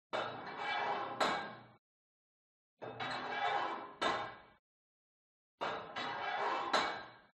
GYM Put Weight Onto Barbell Reverby Quick; SPORT

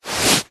foley hand on carpet single short rub; zapsplat

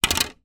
plastic phone cover down on surface; foley; zapsplat

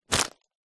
present gift wrapped pick up grab; foley; zapsplat

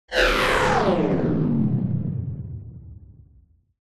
science fiction machine shut down power off

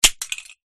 Mouse Trap

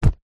foley denim jacket grab hand

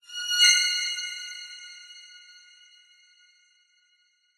hit violin stab reverb shock jumpscare

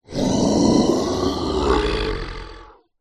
horror monster growl wide stereo; zapsplat

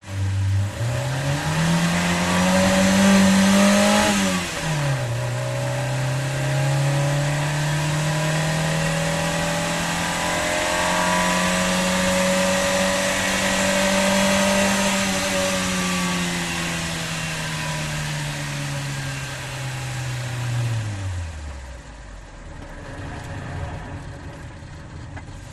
transport car drive fast race engine perspective

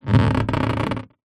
foley balloon inflated squeak rub; zapsplat

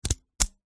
plastic clip mechanism click; foley; zapsplat

